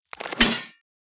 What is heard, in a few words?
lance skeleton strike